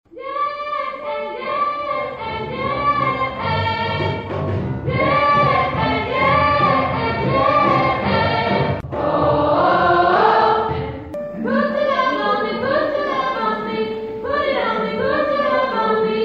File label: tenor